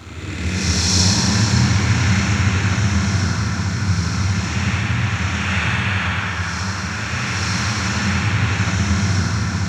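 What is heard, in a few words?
ATM HORROR, Production Effects; Best Service - XXL Production Effects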